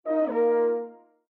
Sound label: Landscape Notify; windows 7